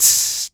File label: Human Beatbox, Beatbox Hits